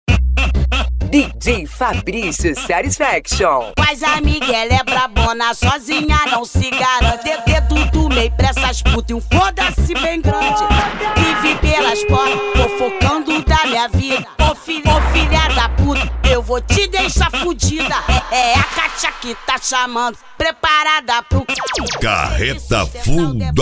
funk

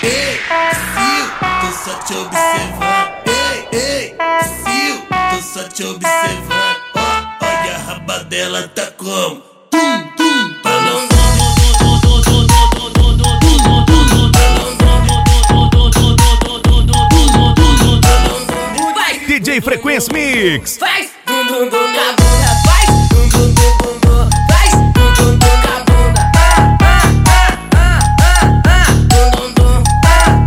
sound car

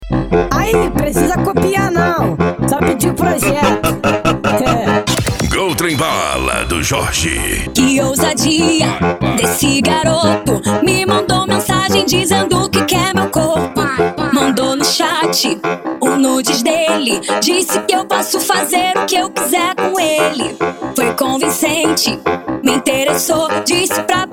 funk mega bass

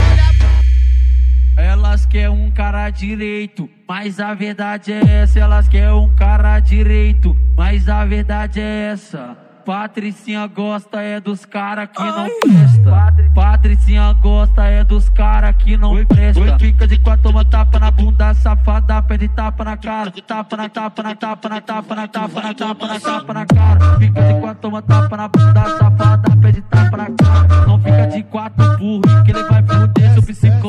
funk bass

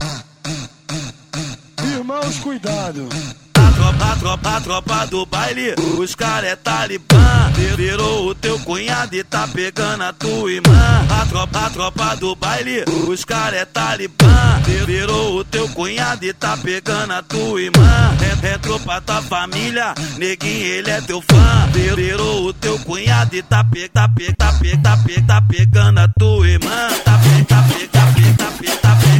funk bass

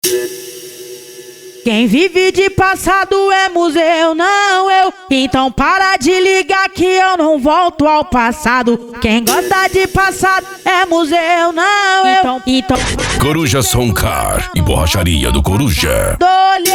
funk; coruja som car e borracharia do coruja

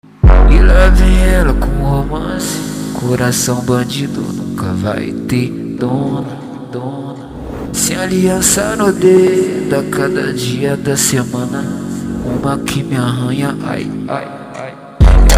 funk

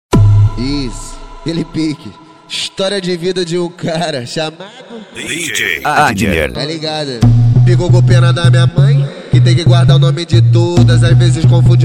funk tumdumdum funk bass

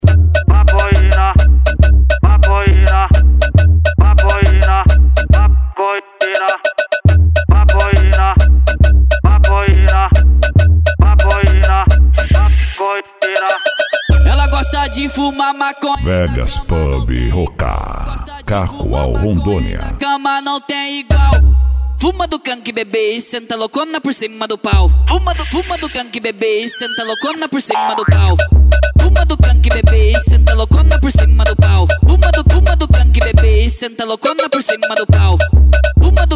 funk mega bass; vegas pub hookah

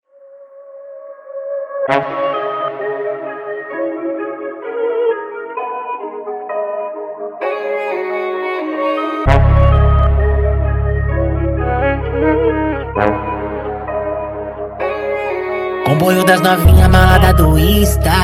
especial funk; cd bad boy som automotivo usa

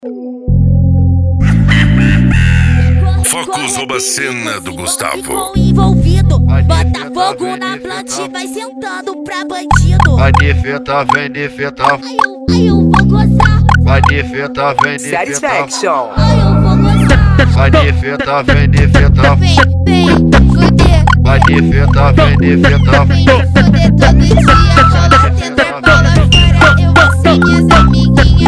trap e funk

